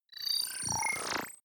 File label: bubble fizz appear, swooshes swishes